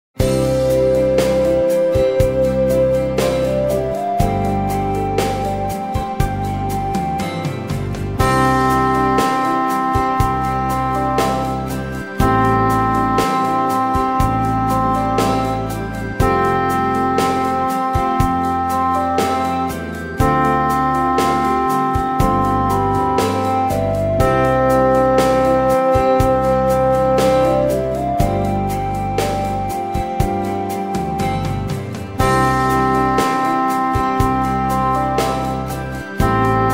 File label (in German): klarinettentaxi